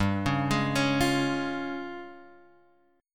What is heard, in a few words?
Db Major G; arpeggiated